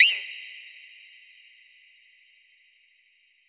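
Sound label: PERC1 Right, FUNK; USB Soundscan vol.47; AKAI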